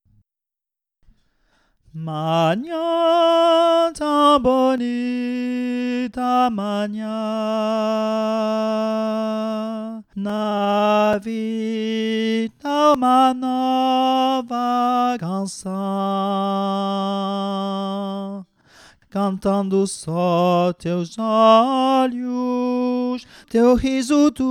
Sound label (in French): tenor VOIX